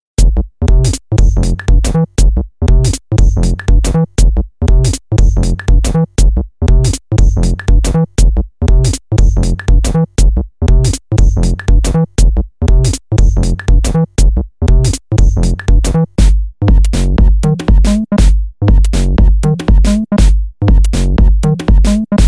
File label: minimal techno